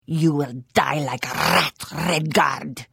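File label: Attack, Khajiit female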